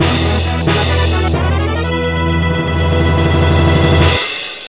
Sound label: Game Clear; Finding Nemo Escape to the Big Blue